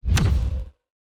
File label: environment building upgrade low